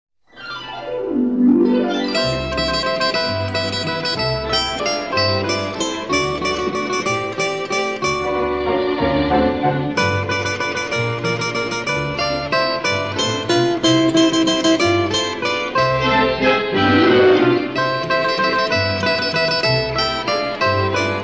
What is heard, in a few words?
Orchestra - Waltz